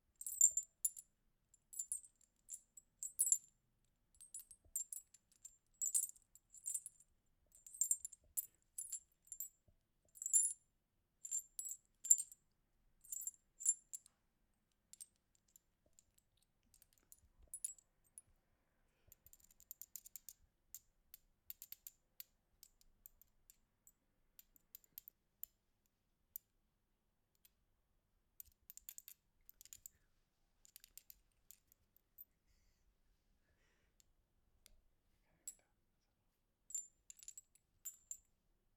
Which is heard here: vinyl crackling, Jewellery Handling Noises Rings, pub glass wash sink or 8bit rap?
Jewellery Handling Noises Rings